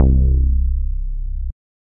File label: Retro Bass, synth bass; Retro Believer Bass; Ableton; Factory Packs; Singularities